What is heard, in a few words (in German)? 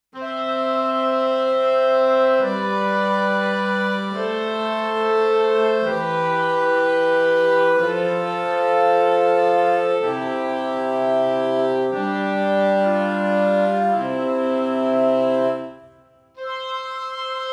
woodwind quintett